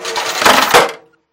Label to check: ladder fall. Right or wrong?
Right